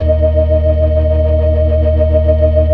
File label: FAST VIB, ORGAN, TREMOLO VIB